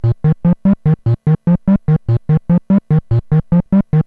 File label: synth